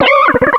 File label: cry